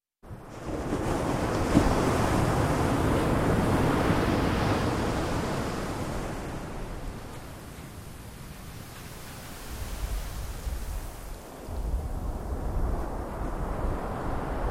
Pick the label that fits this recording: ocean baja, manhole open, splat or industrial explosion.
ocean baja